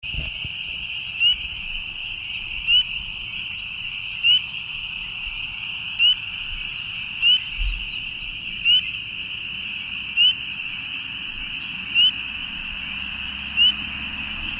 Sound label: spring peeper